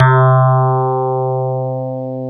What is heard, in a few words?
FM Rhodes; Club-50 - Foundations Roland; FM Rds C